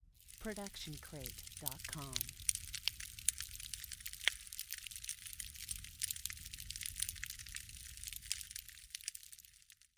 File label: Outdoor Fire; SoundsCrate